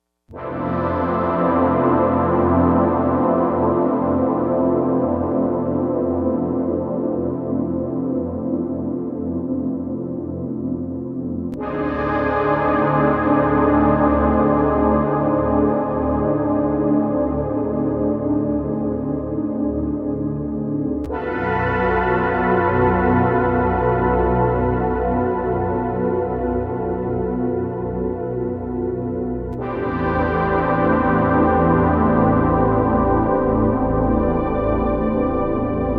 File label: Dark Moody